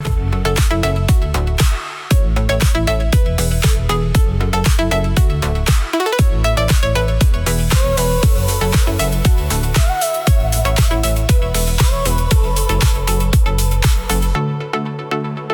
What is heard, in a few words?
loop; bgm; education